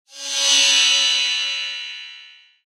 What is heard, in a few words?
Sci Fi Scanner Beam Short; Tech